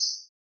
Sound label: Bird Chirp; SoundsCrate